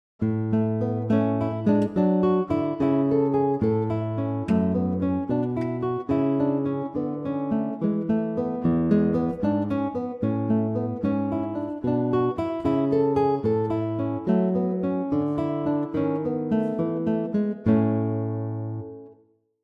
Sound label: guitar; pianoteq8